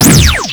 Fire Shot, Weapons; Warframe; Bolto Rifle; Bolto Prime

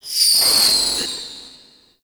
Projectile; Technorot; Warframe; Infested Lich

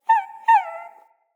Wolf Cub Trapped Call Close

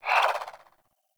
Swing, Weapons Melee; Warframe; Modular Melee

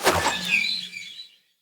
Hunter Bow Ranged Arrow Projectile Start; Weapons; Player; Bows; EnvoyHunterBowRangedMelee

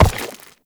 Step Goo, Movement; Uriel; Warframe

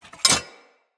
Spear Unequip; Weapons; Player

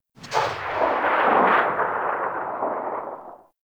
RPG Tail Ext; Hellion Elite

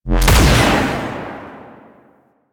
Alt Shot, Blastor Pistol; Warframe; Weapons; Corpus; Plinx

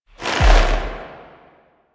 Drifter Pull; Warframe; NineteenNinetyNine; Stage12PartyOfALifetime

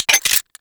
Gunblade Mech

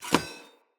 Cleansed Spear Fast Equip; Weapons; Player

